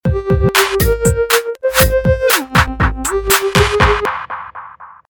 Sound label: Tremolo tone; Samsung SPH-M540 Rant